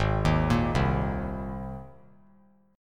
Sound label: G sharp Major Add 9th, chord; G#add9 arpeggiated; JGuitar